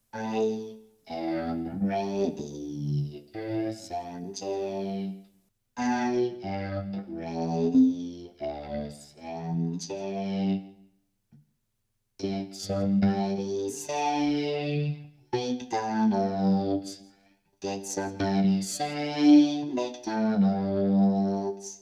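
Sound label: Vocoder, Synth; Synth Battle Royale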